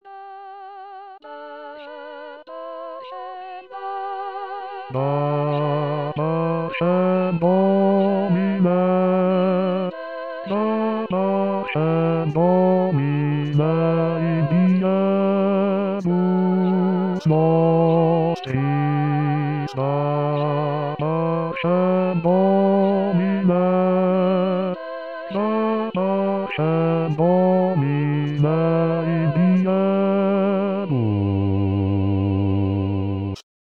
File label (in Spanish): B voz; dapacem; Coro "Con dos Bemoles"